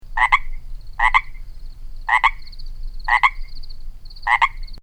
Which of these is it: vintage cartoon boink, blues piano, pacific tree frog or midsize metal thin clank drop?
pacific tree frog